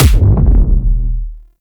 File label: Infested Shotgun Punch; Weapons